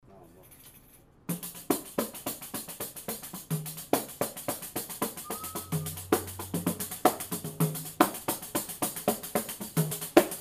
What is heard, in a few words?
solo